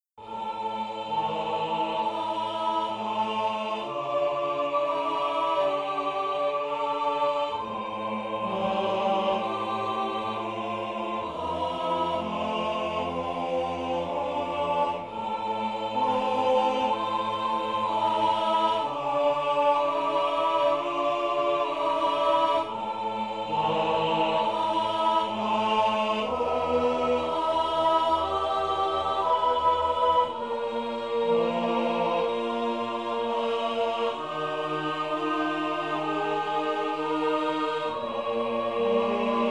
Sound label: minimal